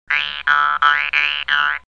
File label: Bounces; Tigger